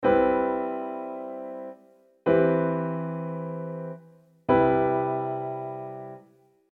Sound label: C maj7 open